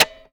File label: Tapeplayer